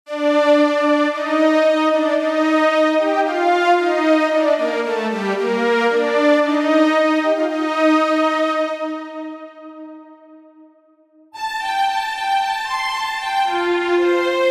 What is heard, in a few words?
Melodies Classical